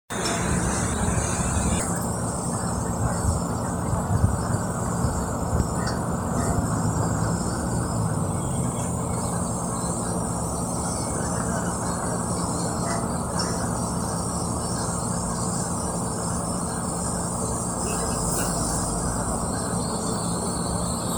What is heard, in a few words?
stop